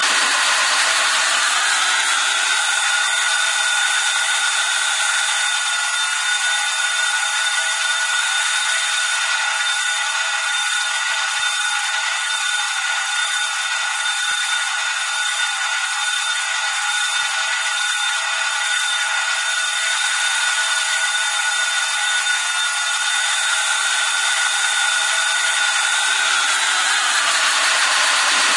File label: warble